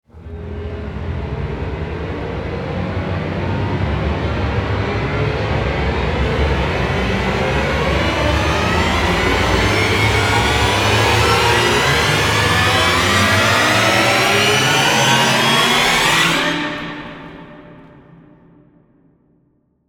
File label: rise